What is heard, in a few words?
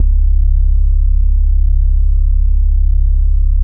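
Yamaha CS80 C# 1; ESOTERICANLG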